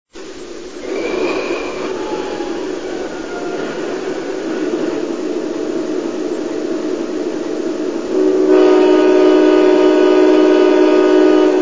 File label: Genesis